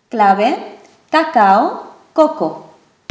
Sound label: clave